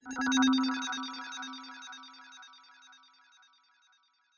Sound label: flutter